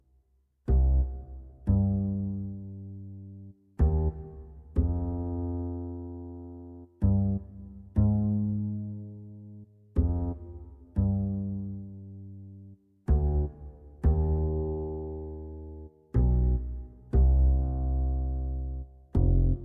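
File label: Wood Bass, digital piano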